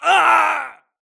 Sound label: grunt death crush; Titanfall 2